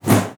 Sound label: BOW PERC, BASS FINGER; Xsample VOL-5